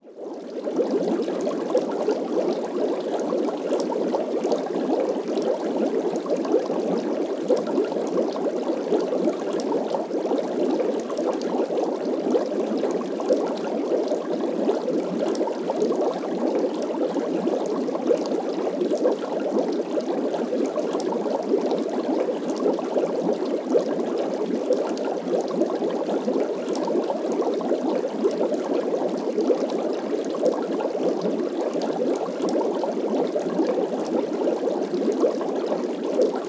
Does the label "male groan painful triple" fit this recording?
No